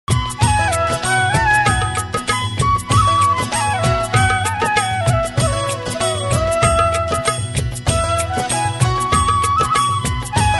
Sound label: Devotional Instrumental ringtone